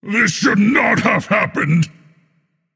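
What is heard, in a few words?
non-verbal boohoo; smite; atlas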